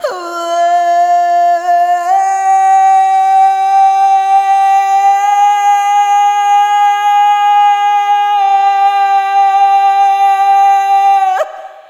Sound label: Arrhythmic F